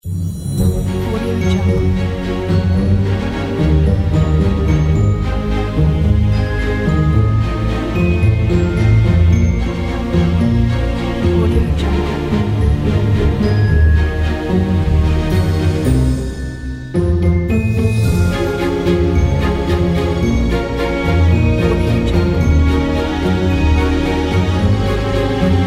classical inspiring

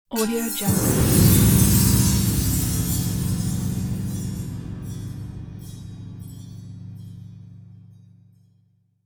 magic dissonant tension hit

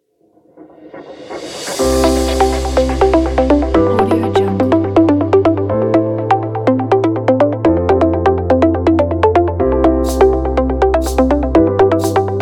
corporate motivation

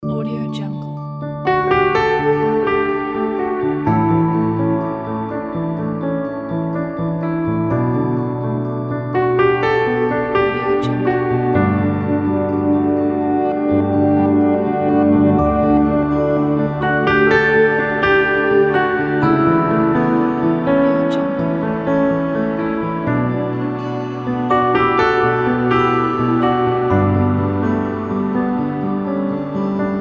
short piano fragment

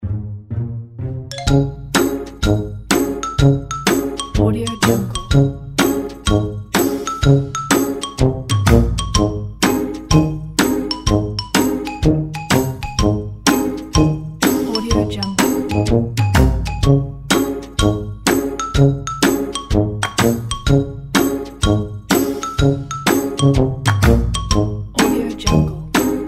cute funny playful children

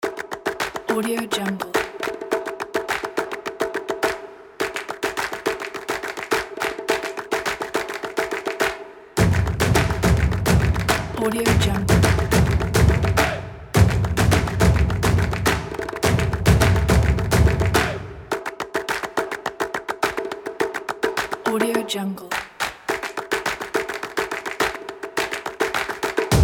stomp claps chants kit

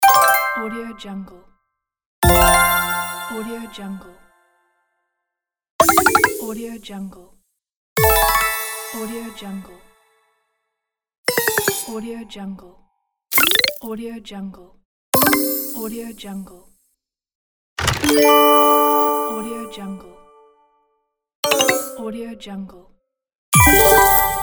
game sound effects pack; gamechestaudio

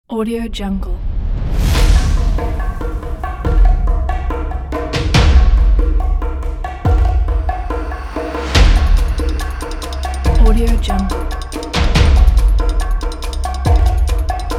the tribal drums; mrclaps

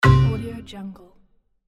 marimba button

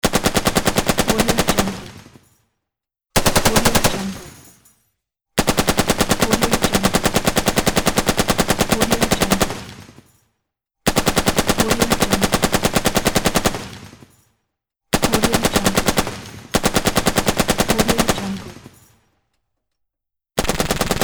heavy machine gun pack

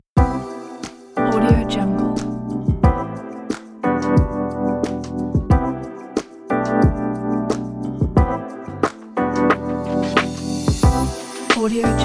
soul rb lofi

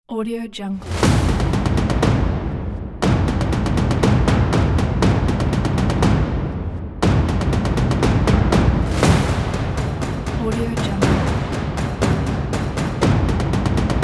epic action war

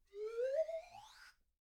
slide whistle

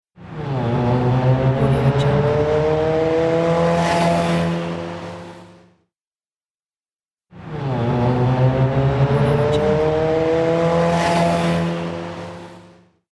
turbo car driving fast on highway

